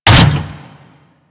Step Metal; Warframe; machines; Jackal

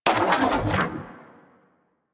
Arch Gun Primary Beam End, Weapons; Warframe; Archwing; Hildryn

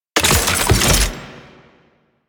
Scan Pillar Plant Close; DE; Warframe; Frumentarius; Scan Ability

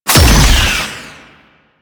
Slinky Dead Zone Cast; Warframe; Man In The Wall

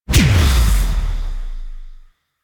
Wisp Harness Impact; Warframe

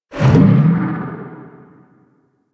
Fishing Gulp; Duviri Maw Fishing

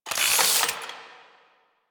Sniper Zoom In; Kahl Sniper

